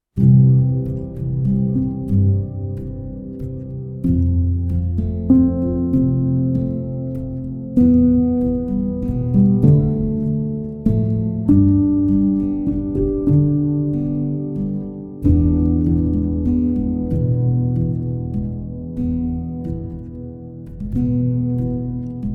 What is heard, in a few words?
Instrumental; Cranes Landing